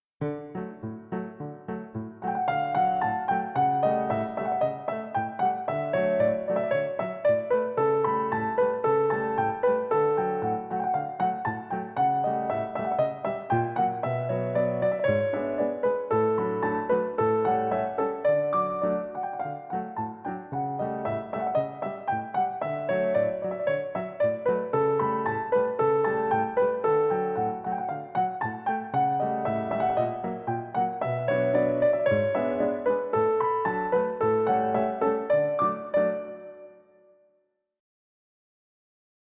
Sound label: Fast Battements Tendus Jete 8x8; Ludwig Minkus